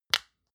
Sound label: lotion large lid close hard; berufe; friseur